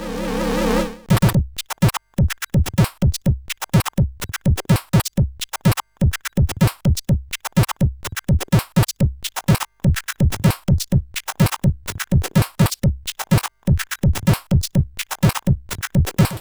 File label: Fat Beats, CSG8580R5